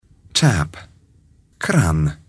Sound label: tap